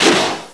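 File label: crowbar miss, weapons; ricochet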